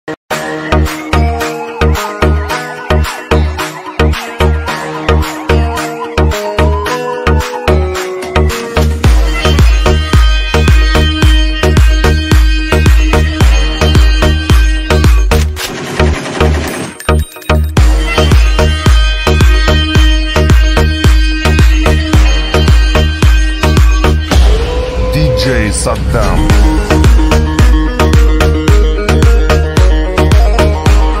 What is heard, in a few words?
arabic club remix